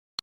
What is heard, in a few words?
click